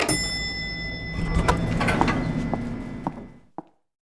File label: empty